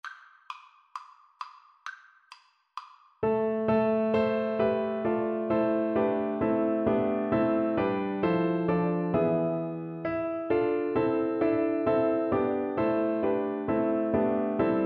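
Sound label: recorder karaoke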